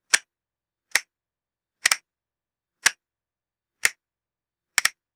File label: sharp plastic cell phone button clicks; ui